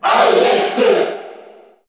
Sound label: Cheer Korean; Alex; SSBU